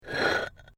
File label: PAVING STONE MOVE, dragging